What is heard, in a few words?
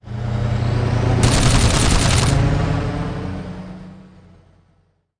future military jeep